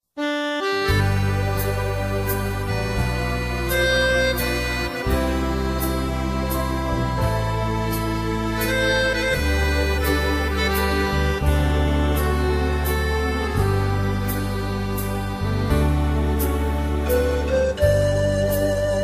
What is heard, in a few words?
reggae gospel old timeless best music